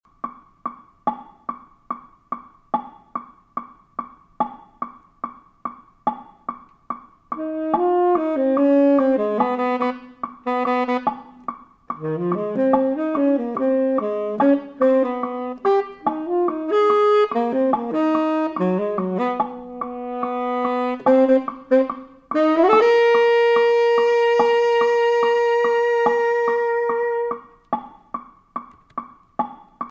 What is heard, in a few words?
jazz tenor latin